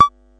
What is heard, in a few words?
silly elec fx; drums full kits; unusual kits and fx; Silly Electro FX Kit